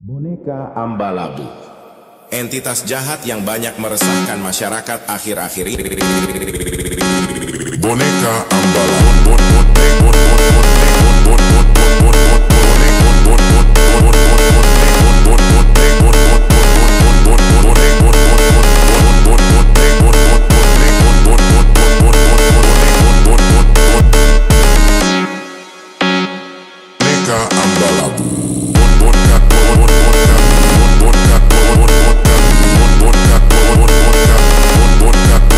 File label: Funk